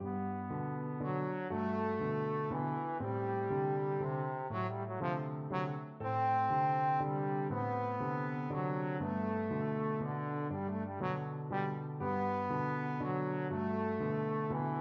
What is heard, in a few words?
trombone